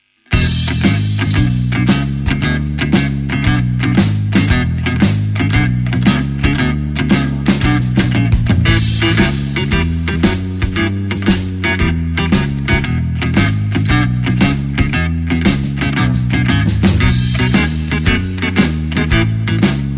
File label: E shuffle powerchord cliche